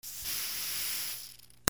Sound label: BATHROOM